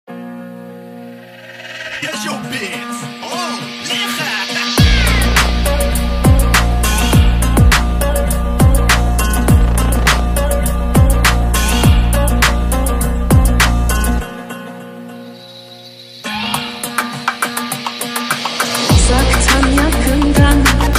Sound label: Beats; Kejoo Beats